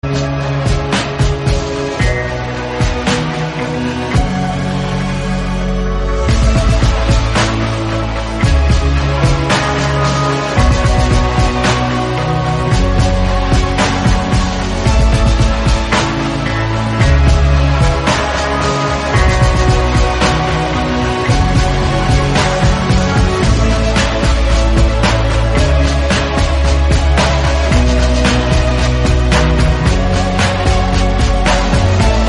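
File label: instrumental background music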